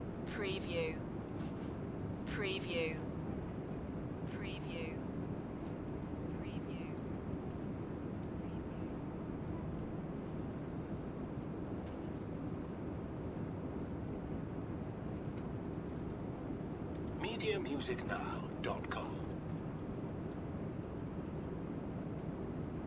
Aeroplane cabin; beanstalk audio

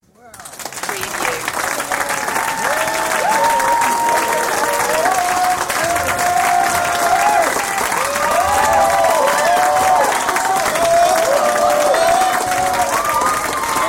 Audience Applause; beanstalk audio

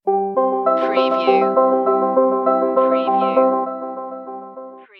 transition stinger

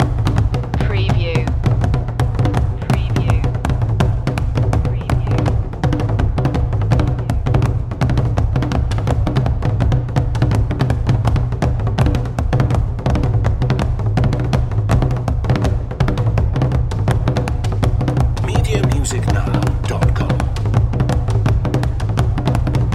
african drums kuchanganyuikiwa loop

